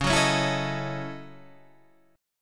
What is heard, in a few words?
Gb Minor 13th Db